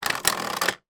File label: toy garage plastic elevator down; sound effects one